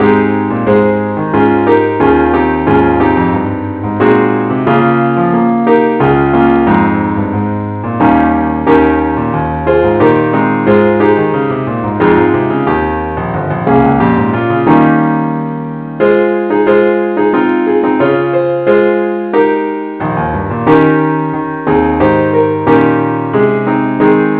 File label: keyboard only key aes; i need thee